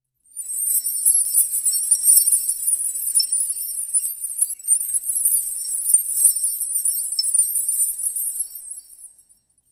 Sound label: bear movement squeaky st; fabric